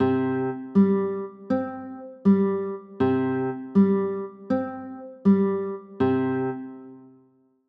c 6, chords

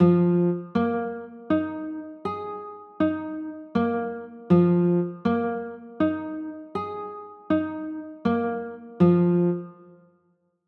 b flat 7sus4, chords

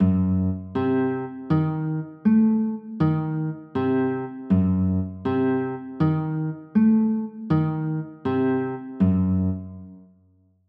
c dim7, chords

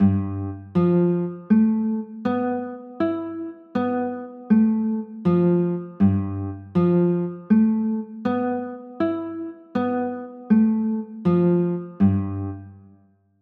g 13, chords

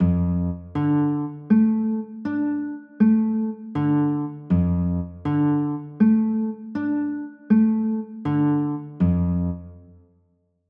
d m, chords

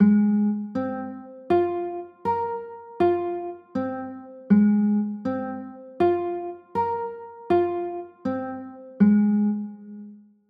a flat 6 9, chords

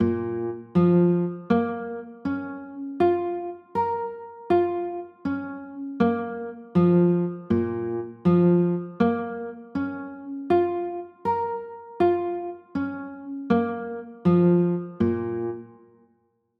b flat m, chords

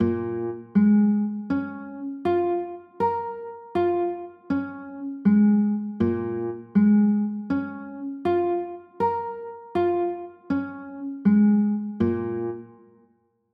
b flat m, chords; b flat m7

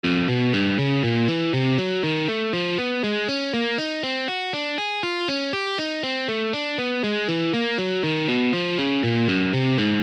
1234 1 string skip, alternate picking exercises

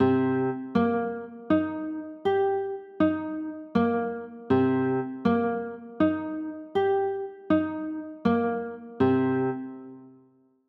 c m7, chords

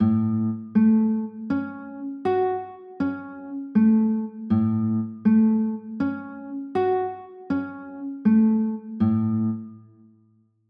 a 6, chords

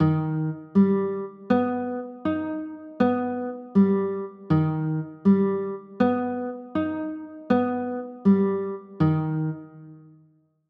g aug

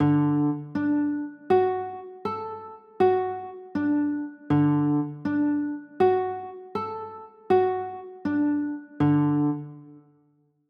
d maj, chords

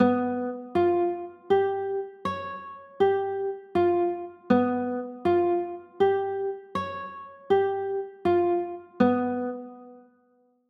d flat 7b5

